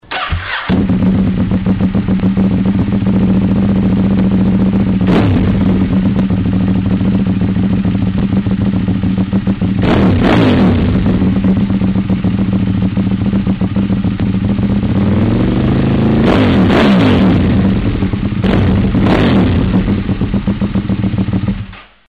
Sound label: ducati 1098r